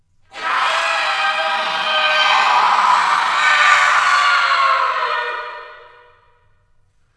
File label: skull shriek